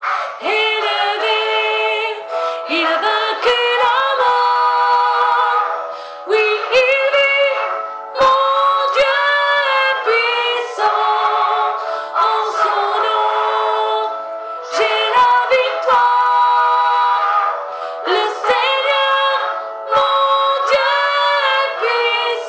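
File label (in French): voix soprano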